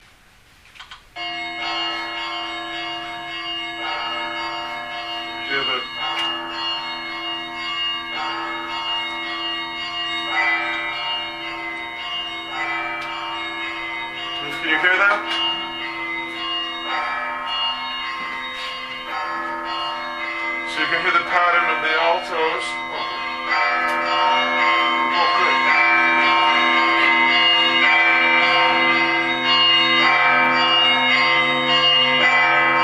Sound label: Bell Tolls